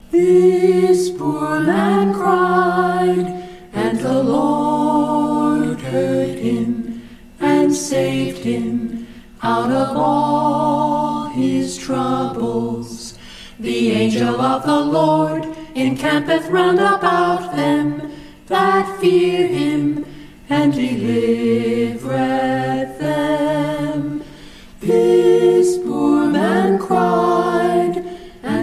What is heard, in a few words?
a capella scripture song; psalm 34 6 7